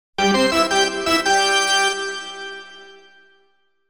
Charge regular; Soundzabound Volume 2 Disc 1

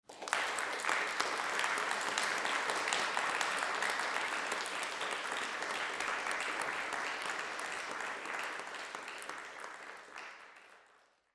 Applause Crowd

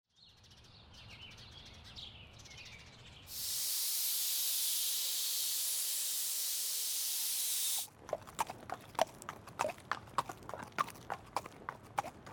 Animals Sampler

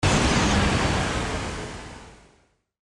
Blast Digital

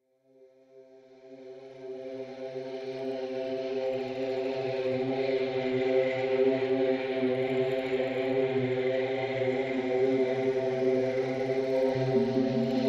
Alien Monks

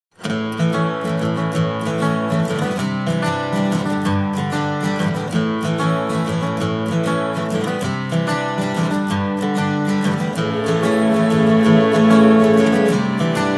Yearbook, graduation; Sound-Alikes; Soundzabound

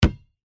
ball bounce single

